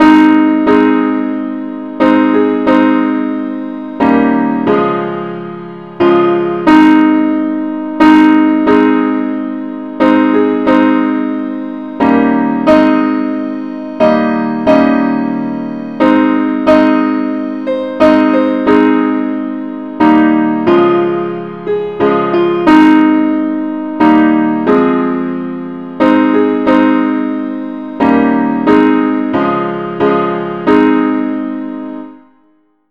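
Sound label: simple accompaniment key a flat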